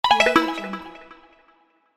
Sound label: notification sound